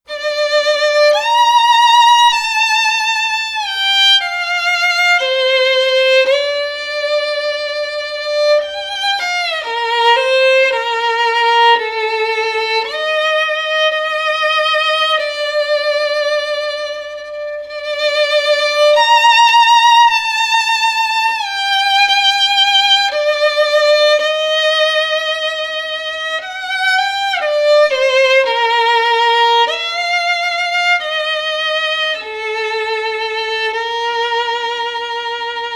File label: Violin